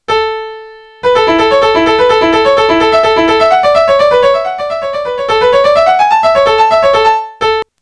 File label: piano